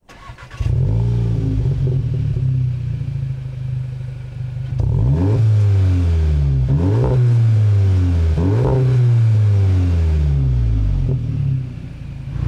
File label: soundcheck